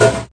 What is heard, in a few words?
TOM, Volca; drums; hip hop; WallsVado Volca Sample Pack